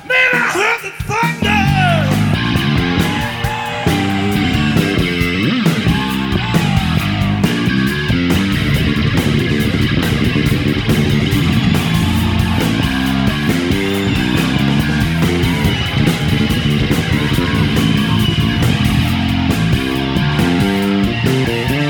Bass Solo

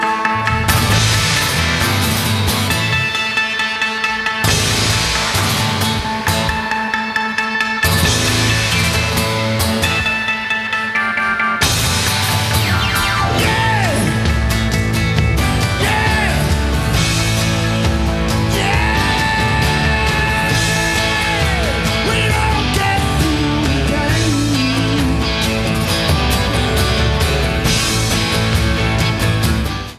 Drum Solo; Storytellers

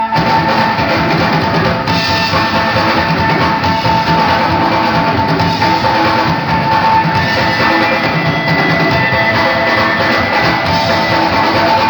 Drum Solo; Odeon Newcastle; October 30, 1971

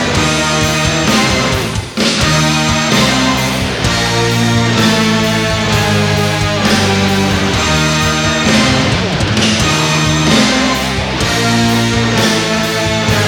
Horror Rock

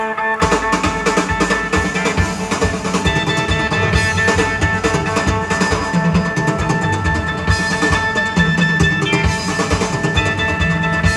Drum Solo; Pontiac Silverdome 1979; 12-07-79

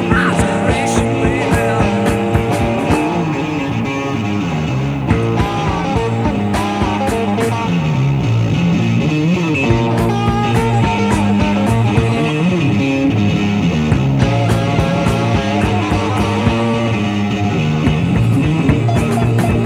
Bass Solos; Live In Amsterdam 1969

